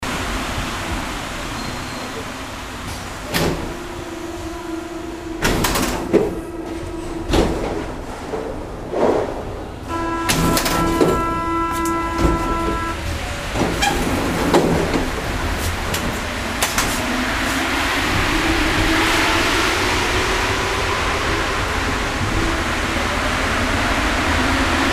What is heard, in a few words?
metro; olympus ls-10